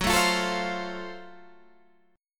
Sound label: F sharp Minor Major 7th Flat 5th; chordshape; down